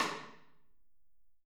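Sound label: snare mixed stick; Q Up Arts; Denny Jaeger Private Collection; CMB KIT 1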